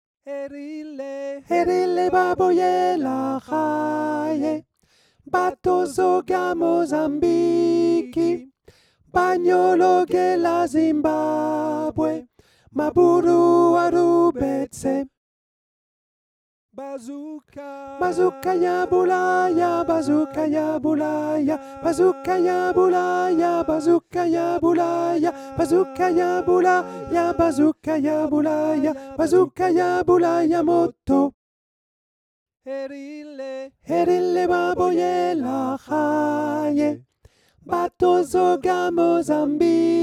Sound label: Alto; Erilé Baboyé (Chant zoulou)